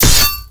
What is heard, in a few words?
Clang